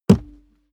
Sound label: step barefoot metal jump